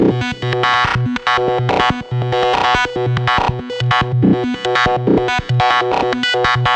B 142 BPM, Psytrance Arp Loops; The Grinch Who Hated Psytrance

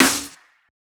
Snare, Dubstep Snares; GhostHack

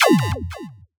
Retro FX; Cymatics; Video Game Sample Pack Vol 1

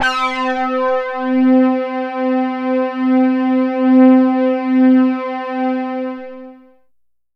Prophet 600 lead punk - C; One Shots; Cymatics; Fantasy Synth Sample Pack

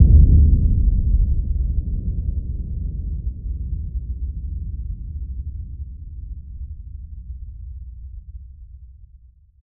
Impact

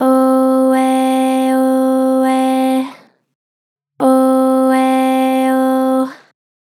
Gen Flat Ohh Eh Ohh, Vocals; Harmor Vocals

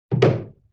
Wood Hit, Vintage Cartoon; Drums & Percussion

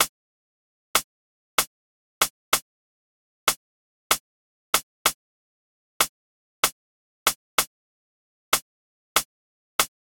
Drum Loop 95 BPM Rim, Afrobeats; Loop Stems; Cymatics; GHOST (Beta Pack); Cruise Drum Loop

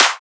Clap

